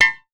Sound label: Miss